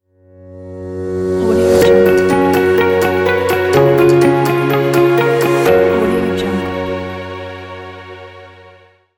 corporate logo

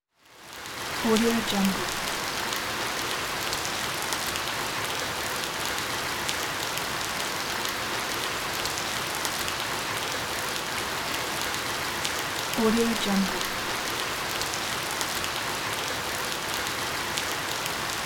rain medium

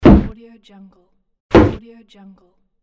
thud

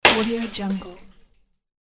breaking glass hit

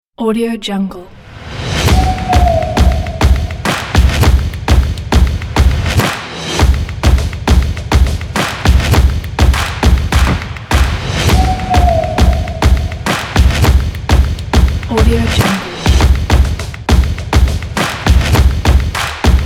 stomp intro music; yerrasound

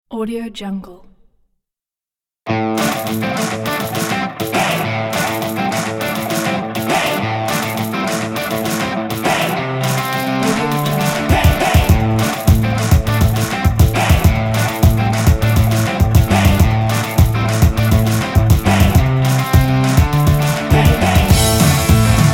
indie rock

